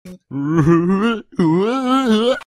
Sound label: weird sound